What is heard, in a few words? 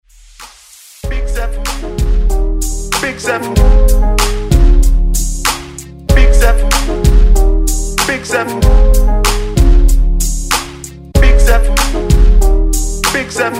Top40 Redrum